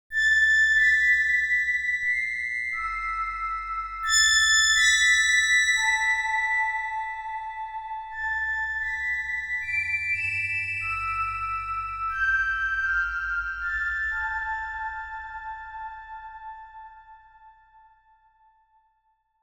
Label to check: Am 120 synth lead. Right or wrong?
Right